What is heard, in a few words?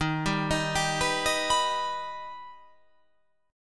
D sharp Augmented D sharp, chord